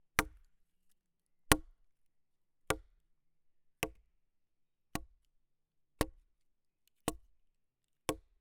Baton sur buche qui sonne bien serie, Percu Bois

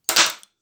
Bruitages claquement sec, metal

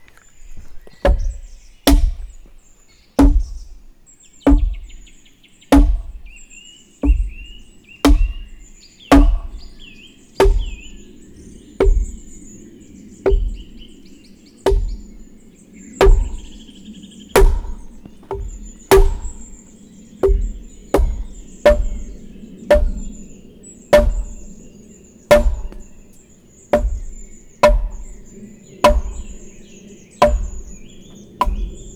Gourdin de près serie, PercuBois; LF loud; 1m

